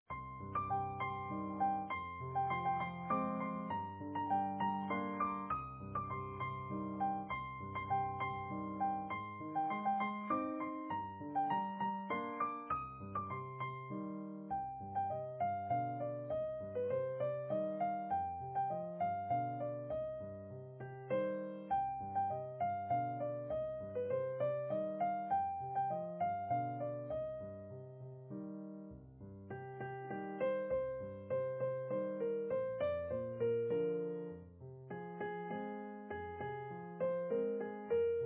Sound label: Easy Piano